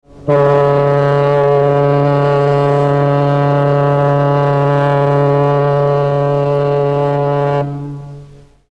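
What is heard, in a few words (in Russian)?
гудок корабля парохода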